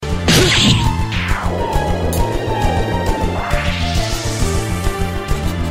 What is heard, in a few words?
Boss Death; Final Fantasy VII